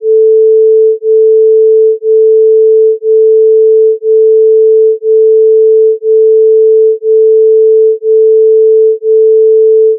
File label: stereo phase test fade transition